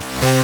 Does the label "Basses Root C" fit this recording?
Yes